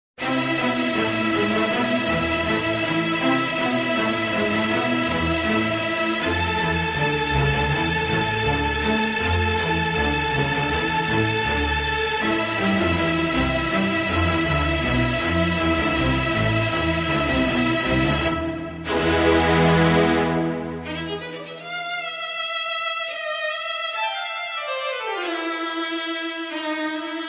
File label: String Orchestra; Waves of the Danube